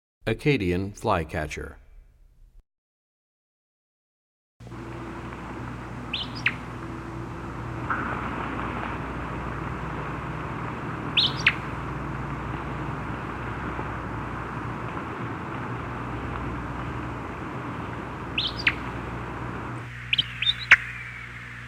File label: Acadian Flycatcher, Bird Songs; 101 Bird Songs